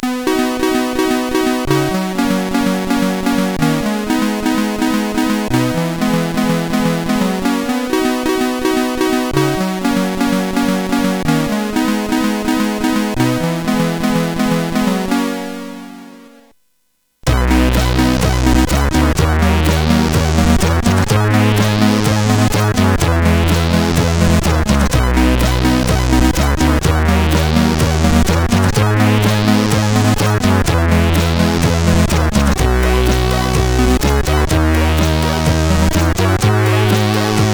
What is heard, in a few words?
Piano; hvsc